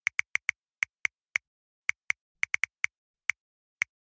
Smartphone texting

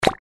Puzzle game tile swap; video game

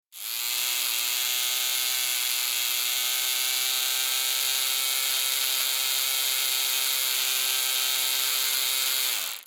Barber razor haircut electric hair clipper

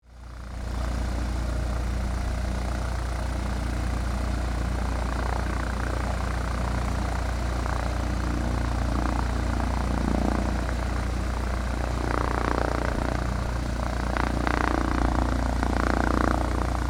Light aircraft ready to take off

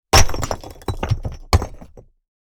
Small rock bricks dropped on rock; impacts

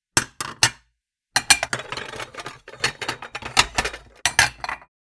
Kitchen furniture stacking plates

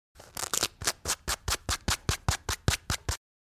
Clothes scratching

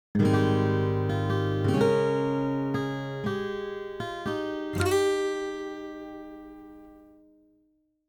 Video game success victory win guitar

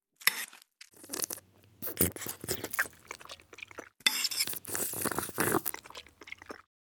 Eating soup light slurping and drinking

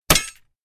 Medieval battle axe chain armor hit

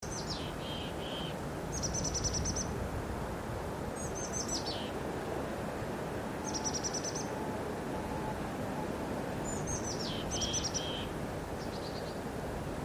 Light wind and birds singing ambience loop

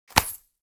Money pouch hand catch; purse

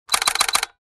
Paparazzi photo blitz

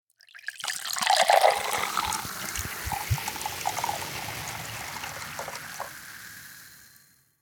Fizzing soda pour bubbles cola; liquid

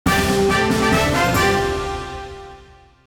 Game achievement success; gfxsounds

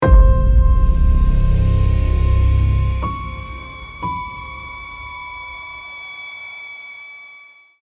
Dark cinematic suspense riser horror

